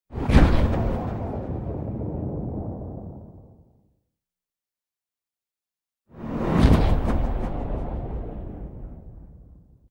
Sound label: Flanged Parachute Opening with Whoosh In x2; Hollywood Edge Sound Designers Tool Kit